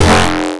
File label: Crunchy